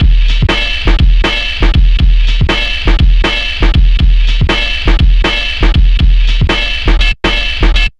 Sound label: drum